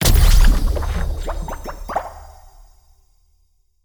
Gem Bubbles

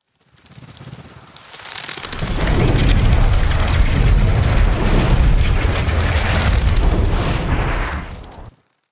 Bats Flying

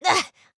Hurt

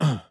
Movement Land; Batman

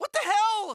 Respawn; Morty

